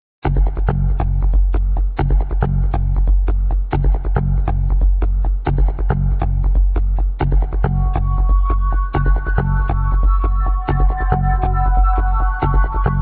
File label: pulse